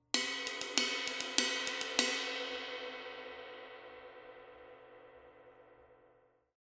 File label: K Custom Special Dry Ride Ride Pattern, cymbal